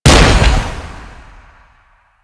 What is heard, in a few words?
Jet Pack Idle Explosion; Jet Pack Marine; Warframe; Grineer